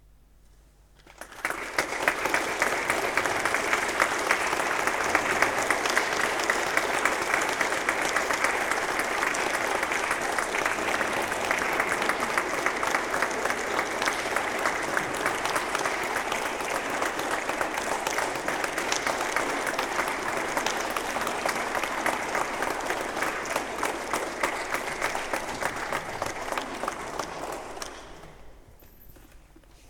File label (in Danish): Klap